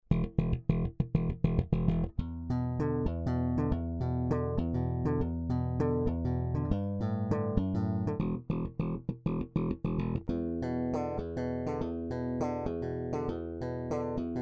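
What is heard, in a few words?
Warwick Streamer Chord Slap Bridge Both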